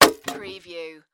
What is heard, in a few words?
Crash Wood Beam Hit